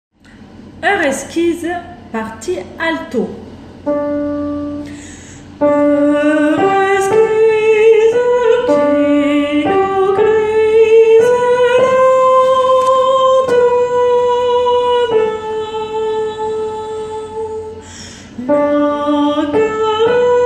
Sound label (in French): Heure exquise alto